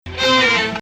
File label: Ajam Low